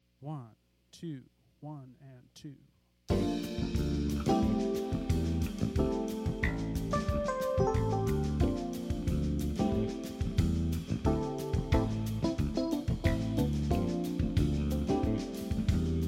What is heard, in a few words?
Redwood 6L6GWB